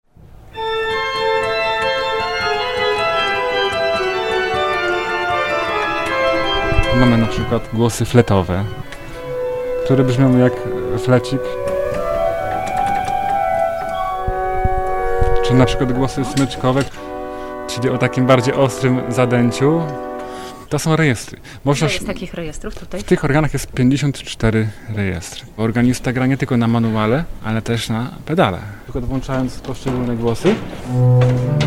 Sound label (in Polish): organista Organy Englera